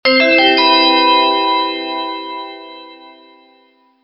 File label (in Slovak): Ding, Bezdrôtový gong